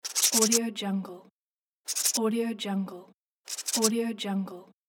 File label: marker